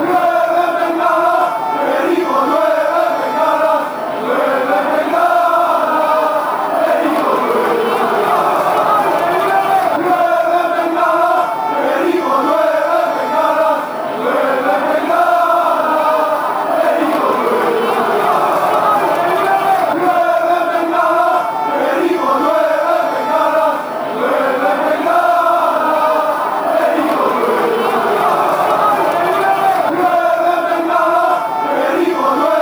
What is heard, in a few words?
move, chants